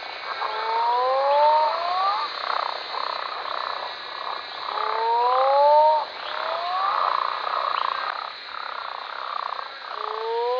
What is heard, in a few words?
Rhinophrynidae Rhinophrynus dorsalis, Frog Calls; deep ascending "whooooo"